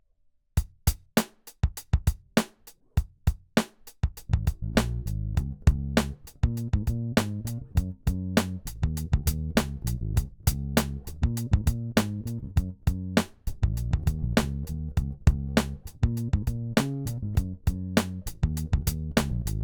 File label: MTD Motown Sound in Db